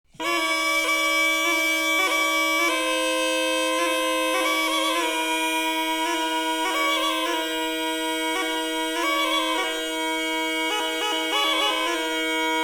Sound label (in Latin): Tibiae impares, Wind Instruments